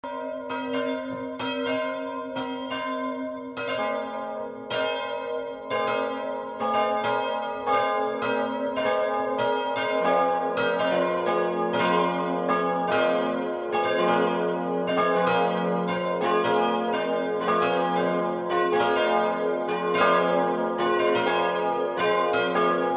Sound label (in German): Glocken